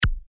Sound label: button rollover; Danger Mouse - Ultimate